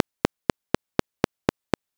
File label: SYNC MONOTRIBE